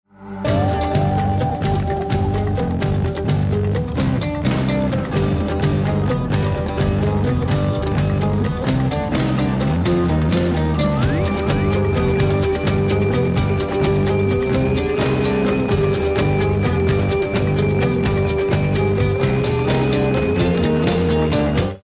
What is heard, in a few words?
start round, music; hades